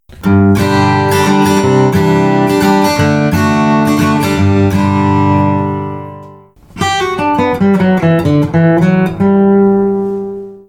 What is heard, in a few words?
Picks; Dragon s Heart; Serdtse drakona